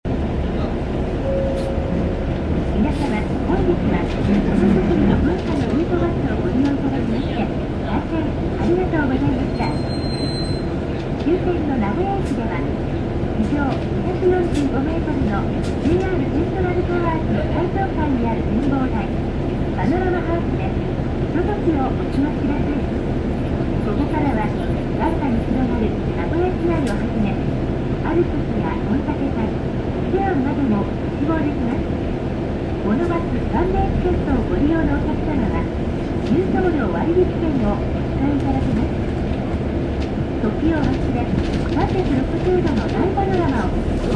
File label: bus tape orgel; aichi